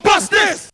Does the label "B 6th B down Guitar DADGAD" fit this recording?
No